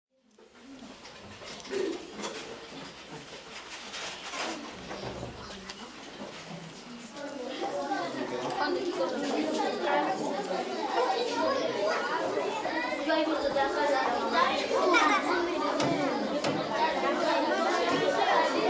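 Ambience children, Lunchroom or Party Room, indoor, Bolivia, 20-30 children, kids walking; Cafeteria; talking, laughing, playing, in foreground and background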